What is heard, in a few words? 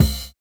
Kick, jungle breaks drum sounds